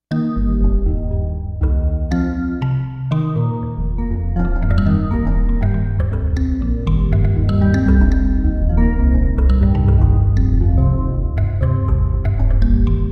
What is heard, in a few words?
Rhythm; Concert 01